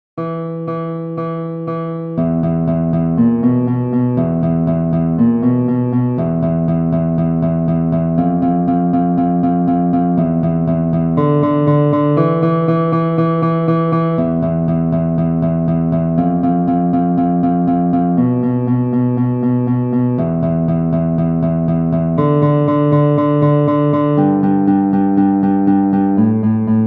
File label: Gtr